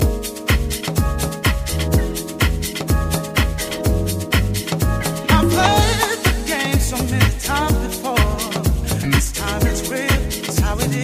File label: Voc